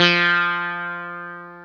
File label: EL SITAR F2, Elec. Sitar; Jones Elec.Sitar